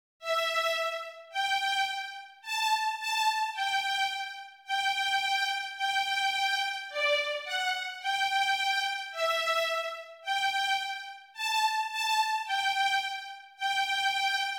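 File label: Happy Farmer Violin 3; Schumann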